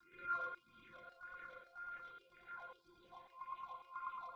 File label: Mellotron After Processing